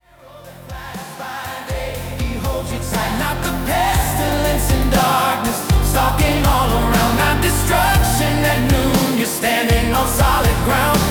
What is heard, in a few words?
pop style; Psalm 91 Under His Wings; latin pop style